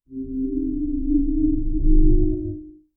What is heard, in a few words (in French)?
pop sonore grave suivi du, fail